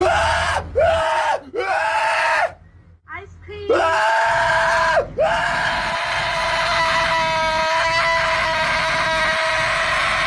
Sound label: scream